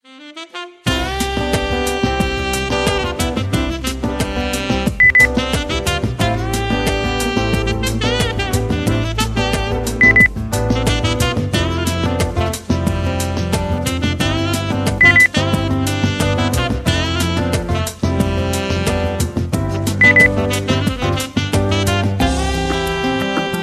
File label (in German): pop